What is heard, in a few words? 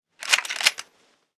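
Weapon rifle jam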